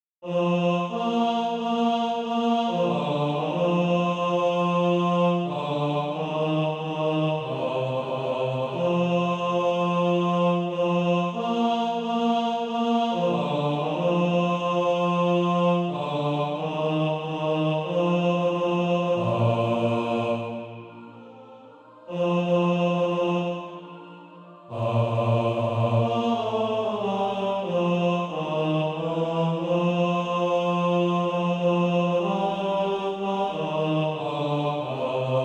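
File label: bass, Practise Music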